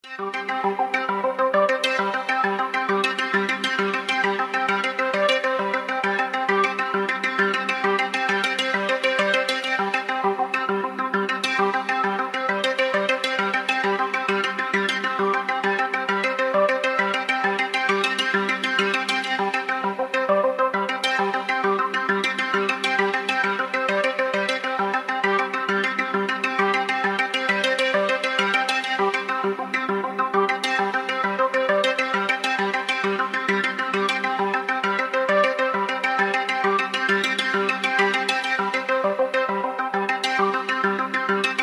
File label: ambiance